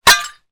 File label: glass thick pieces down hard ground together; impacts